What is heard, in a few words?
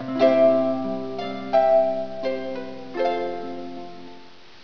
harper